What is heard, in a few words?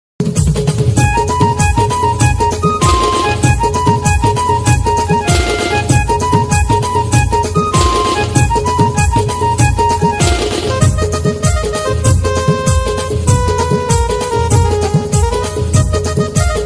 koligeet brassband ringtone; aagri koli eastindia